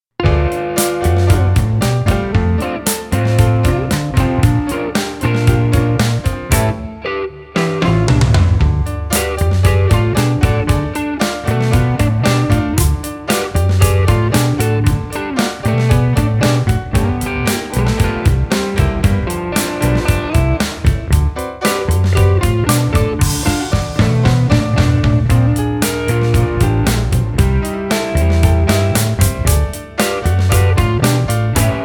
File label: Trippy Groove